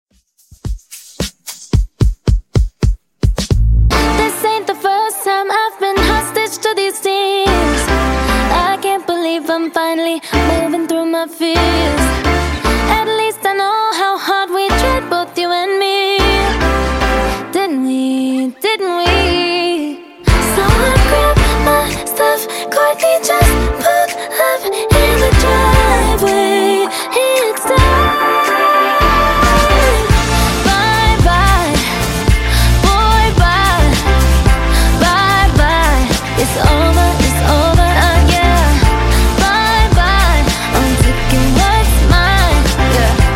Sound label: Intro Outro Clean 110 Bpm